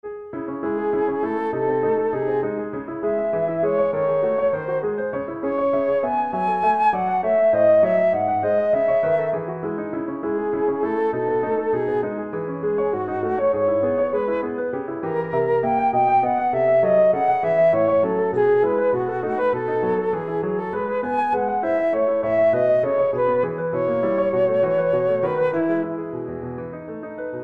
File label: Meditation